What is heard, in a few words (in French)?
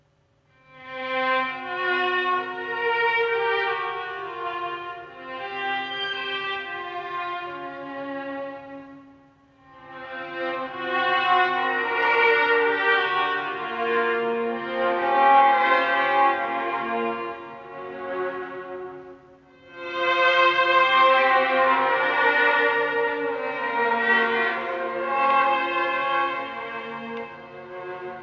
Suite en duo pour violon et alto, Invention